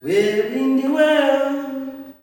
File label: wey windi, male; Spectrasonics; Heart of Africa 2; S.MALEAMB